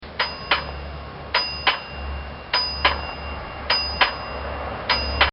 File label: Gas Station Air Pump; Transportation (Sound Effects:Backgrounds)